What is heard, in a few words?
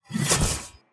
vampire strike; Shelter